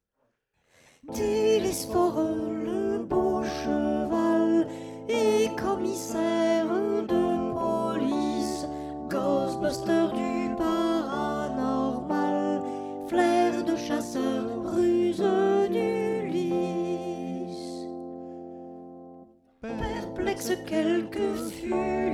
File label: soprano